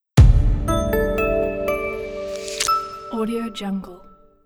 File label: short branding logo reveal light